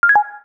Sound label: Key Clear, keytone; Samsung SGH-T369 Linx